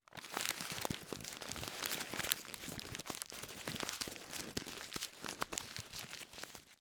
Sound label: crumpled paper raw